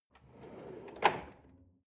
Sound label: drawer large draw open lock